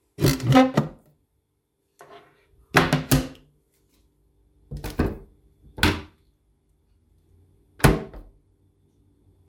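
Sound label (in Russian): старый шкафчик с тугими дверцами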